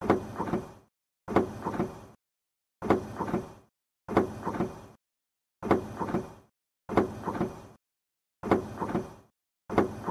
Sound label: copy machine